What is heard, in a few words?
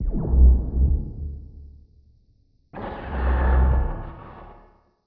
sparking distant